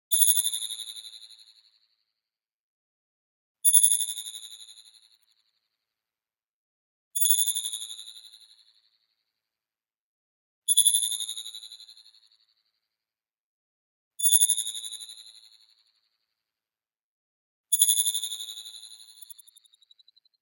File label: BLING Light Ping Pitch Rise; boom library; Anime Essentials